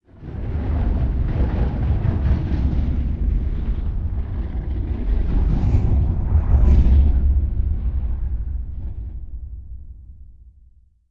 citadel outside; atmosphere; matmos hl

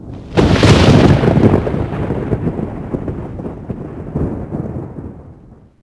thunder, ambient weather; HunterZ G4; minecraft

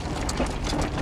minecart base

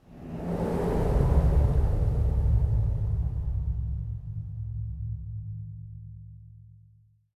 cave, ambient; HunterZ G4; minecraft

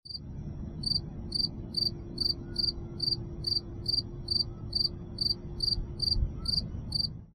critter; matmos hl; wildlife

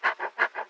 panting, mob wolf

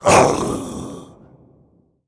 zombie pig angry

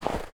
snow, dig; HunterZ G4; minecraft

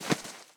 snow, step

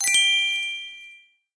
positive action; matmos extras

